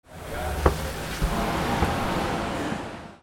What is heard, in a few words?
DOORS